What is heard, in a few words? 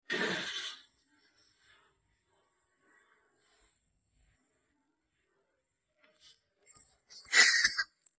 cat funny cute meme vocals